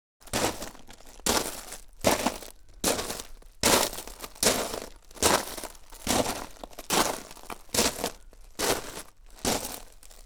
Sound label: Gravel, Footsteps